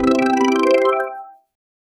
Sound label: a rich modern layered dev, device